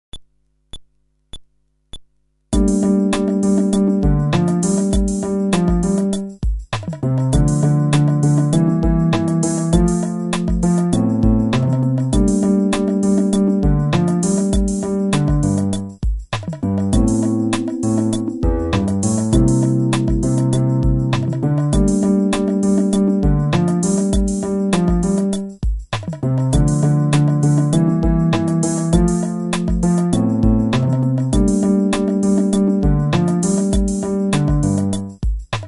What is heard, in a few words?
Groove, fretless bass